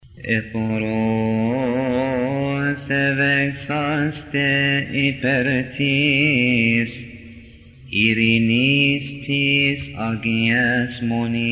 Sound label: church coptic, hymns, altar responses